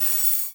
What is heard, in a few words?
Multimedia Internet CD-Rom Flash Element